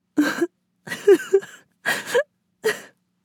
Young woman sobbing and whimpering

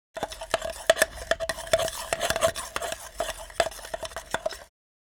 Hand whisking plastic bowl with wooden spoon

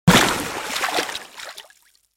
Swimming pool dive in with a splash

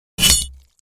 Sword swing swipe hit body

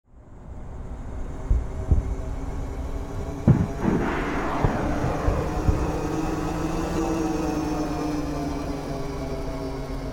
Drone attack and explosion

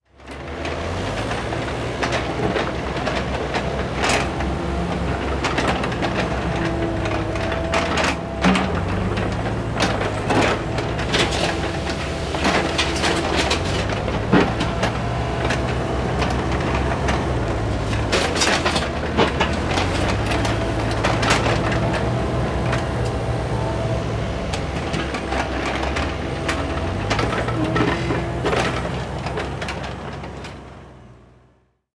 Excavator construction vehicle bucket move

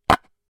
Wood jewelry box close

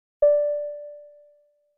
Elevator bell ring tone

